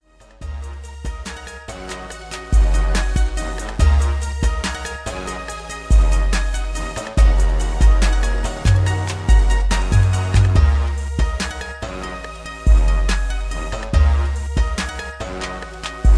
71BPM

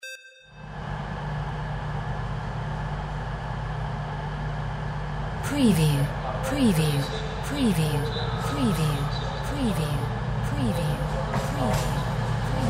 AMB TRAIN STATION ENGINE WALLAS

